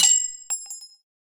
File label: Harpoon Shoot